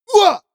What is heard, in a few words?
male combat attack short